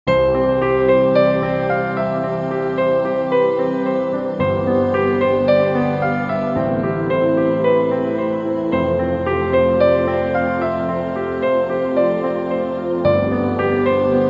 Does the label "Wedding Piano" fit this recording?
Yes